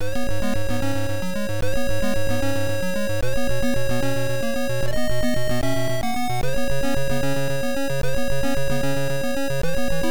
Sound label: Enemy Approaching; Cosmic Psycho; MSX2